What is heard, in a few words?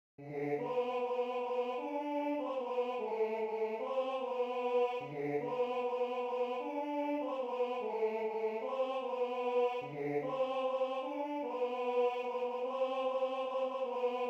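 male choir, Tenor I; Mir lebfrischn Hirtn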